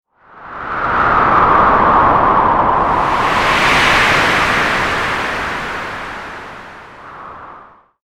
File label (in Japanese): 風 強風